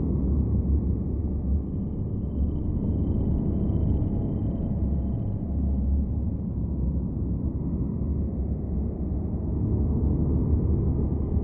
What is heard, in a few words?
ship transport ship idle; Stellaris; aquatics; ships